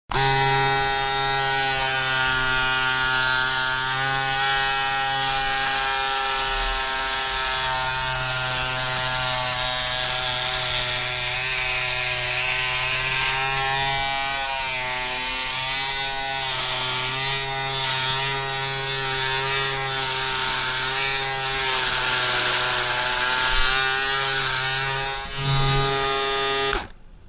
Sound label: electric